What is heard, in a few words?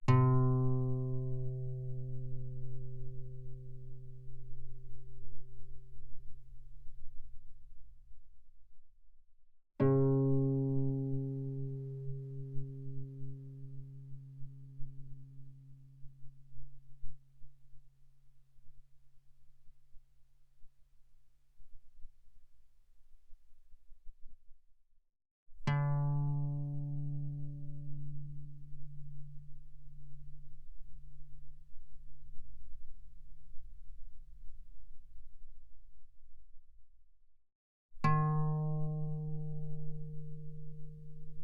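Guitar mezzo forte sul E C3 B3; mono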